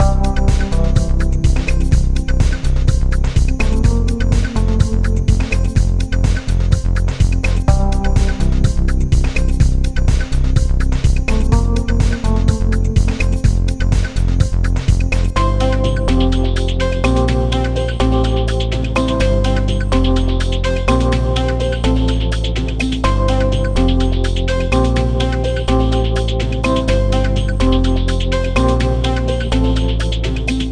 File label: Music Results; Smart As...; PS Vita; Music Arithmetic - Results2